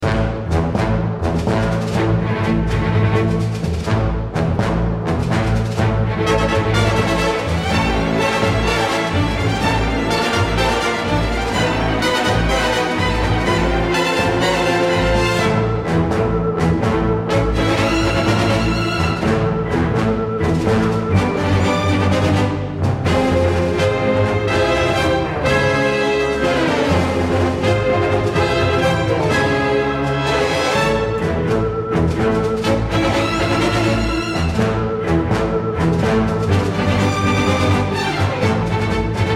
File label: battle; Manamon Music; Manamon 2; rubin